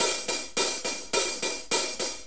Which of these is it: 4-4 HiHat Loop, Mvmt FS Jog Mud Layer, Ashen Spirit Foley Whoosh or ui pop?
4-4 HiHat Loop